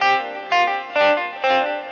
ELEC GUIT ETHNO 125, Guitar; eJay

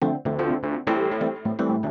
organ house 125 A; eJay; Keys

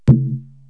Single Tap on Balloon; Outdoors & Nature; Balloons

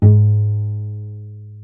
pizz cello; DirectX SDK

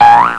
Boing; Apeiron 1.0.2

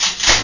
servo start switch

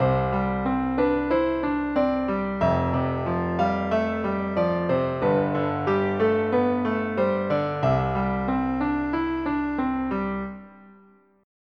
ballad; EuroCD 3

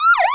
siren; SINDEMO; environment; police

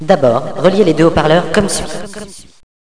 kit; Villagina; Village; ECOLE

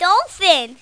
DOLPHIN

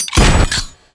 impact; BLOOD2; Ancient

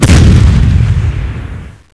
cannon; Empire Earth

